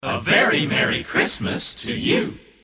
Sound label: christmas kit group shout a very merry christmas to you